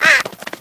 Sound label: die; hl2